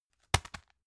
tissue package drop hard

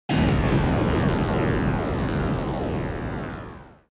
sci-fi lazer drop long

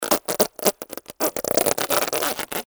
alien

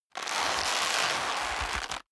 leather creak hard

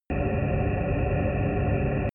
drone spaceship shrill

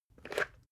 water boiler pick up slide

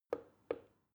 plastic button start click soft slow

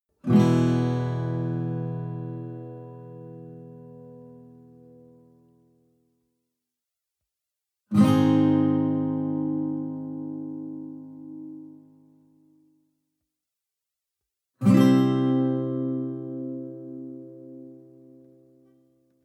western guitar chord full major

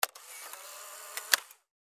polaroid camera

